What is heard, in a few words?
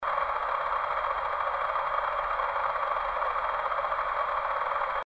rain; myHummy; prasselnder Regen